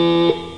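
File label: invalid pin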